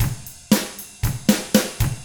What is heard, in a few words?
ROCK